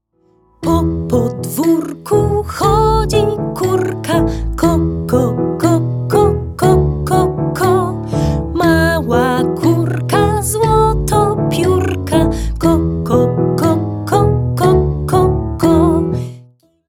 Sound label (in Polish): kurka wokal